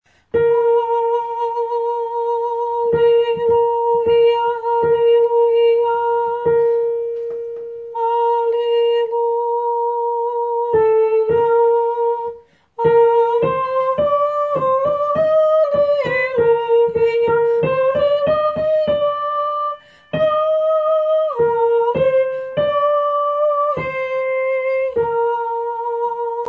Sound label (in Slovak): alilujasopran